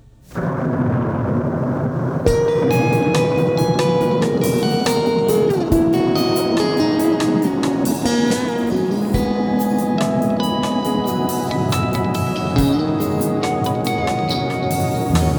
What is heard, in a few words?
Boston Rain Melody, Enchanting Guitar Melodies